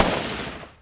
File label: Minigun End; Worms3D